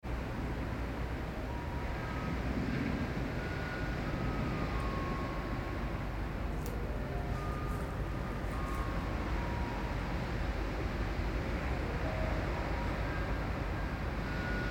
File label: garbage truck